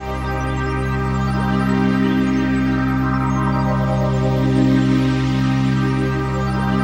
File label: PAD, Dance Mega Synth